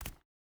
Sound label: footstep barefoot concrete jump land; Rust